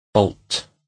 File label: bolt